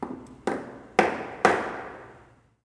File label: HAMMER; zones; construction